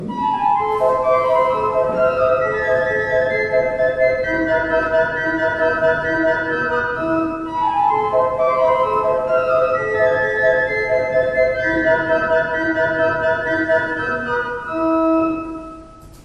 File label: Simon